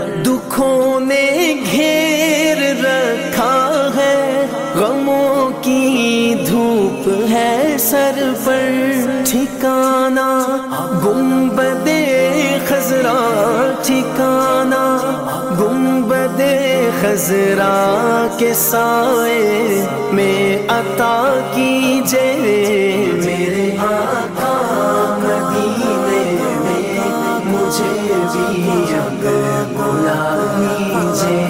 Naat Ringtone

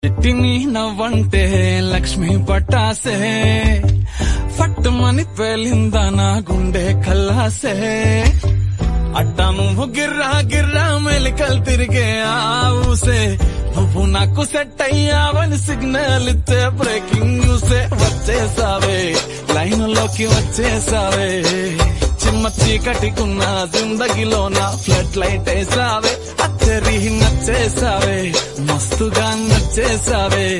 Love Song Ringtone

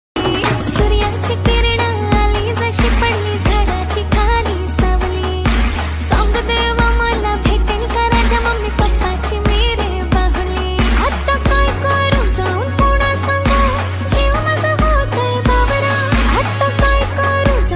Marathi Ringtone

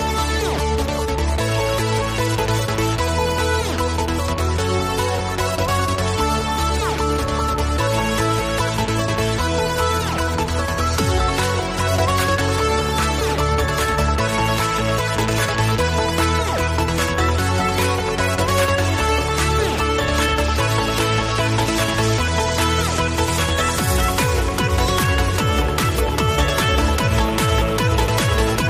Ringtone

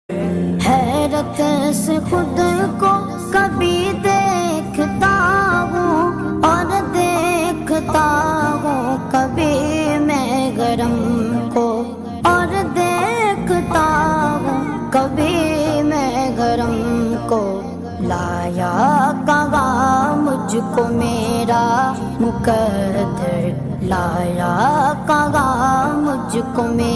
Naat Ringtone

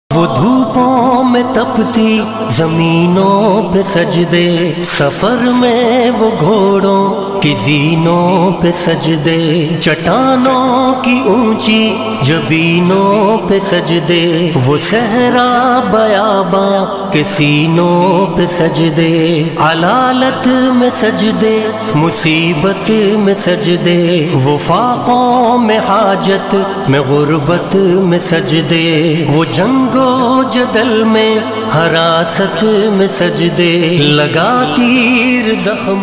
Islamic Ringtone

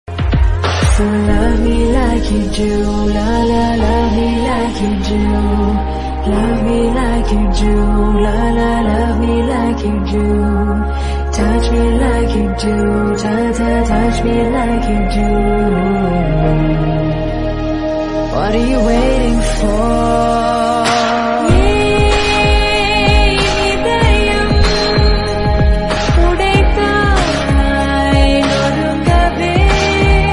Hollywood English Song Ringtone

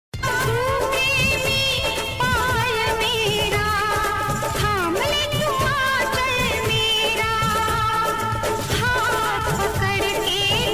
Old Hindi Song; ringtones

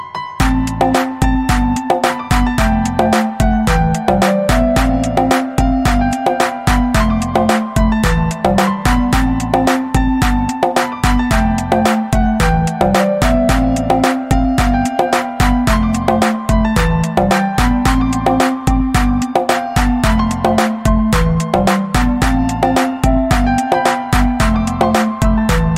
Instrumental Remix Ringtone

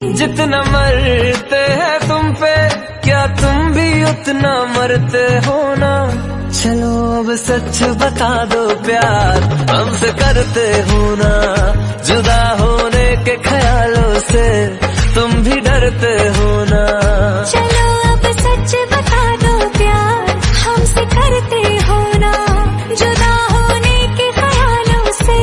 Hindi Ringtone

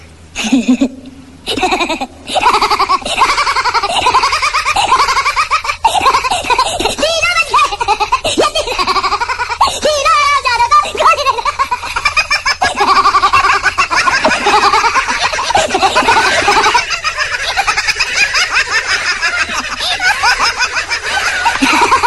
Funny Child Laughing Ringtone

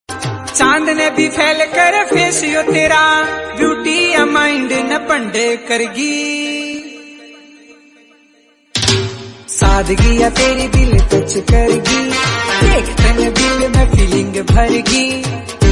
Haryanvi Song Ringtone

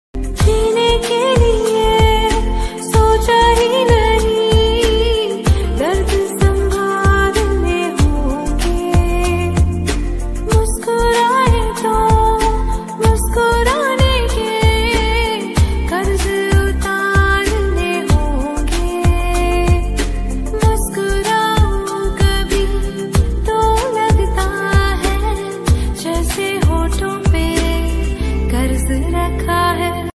Female Version

